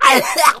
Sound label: smoker pain short